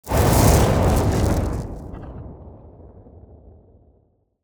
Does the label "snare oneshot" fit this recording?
No